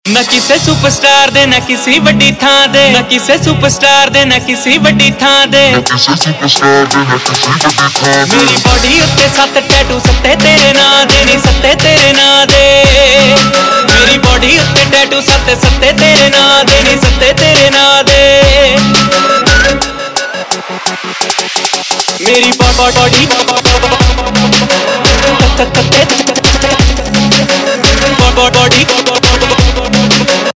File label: Ringtone, Punjabi